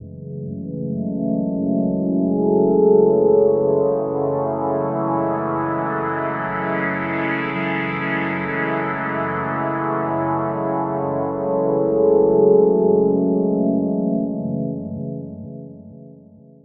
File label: D&B Pad; neuro